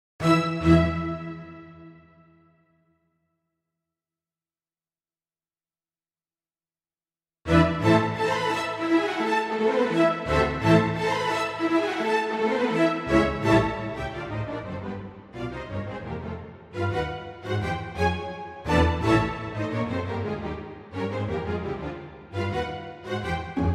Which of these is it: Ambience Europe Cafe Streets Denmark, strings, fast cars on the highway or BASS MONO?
strings